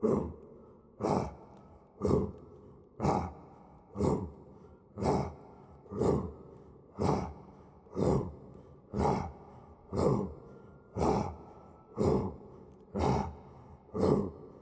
Orc March Choir

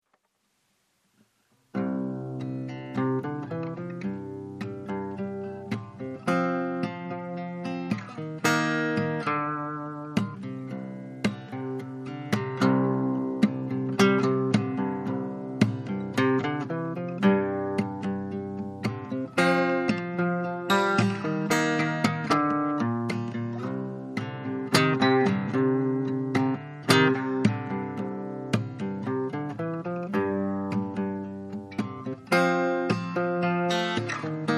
Urban Groove

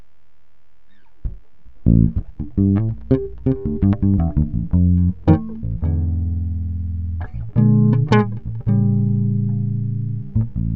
Bass idea

